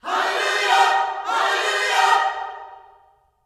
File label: HALLELU, Gospel; Spectrasonics; Vocal Planet Vol.1 Gospel; HALLELUJAH